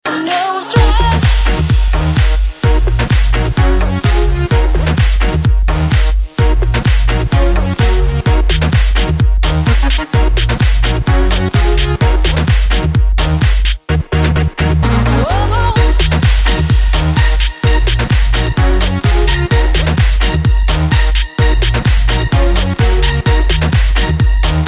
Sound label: Electro